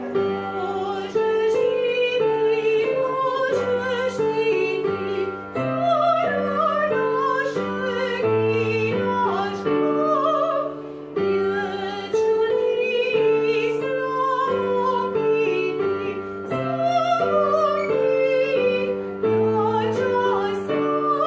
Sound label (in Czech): hymna chorvatsky